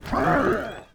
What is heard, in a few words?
hit